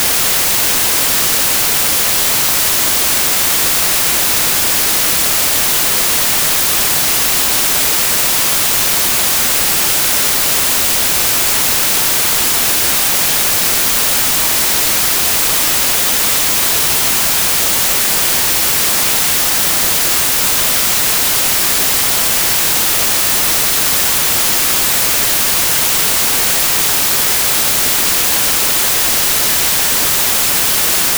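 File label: noise white gauss left right mono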